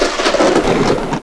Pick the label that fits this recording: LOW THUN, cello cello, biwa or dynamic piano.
LOW THUN